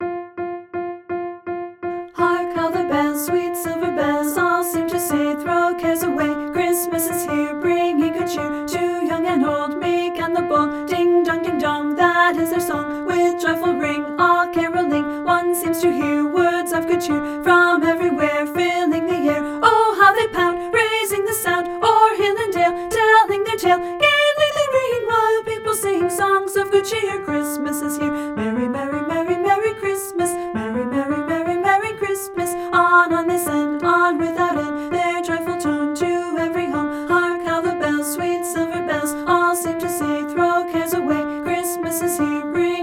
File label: vocal sop; carol of the bells